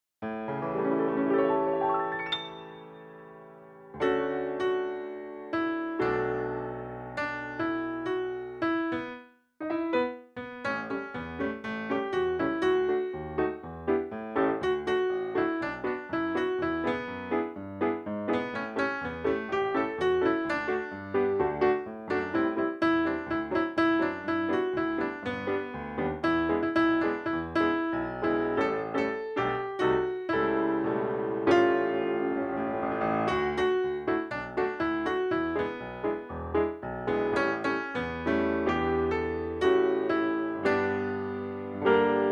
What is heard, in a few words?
Melody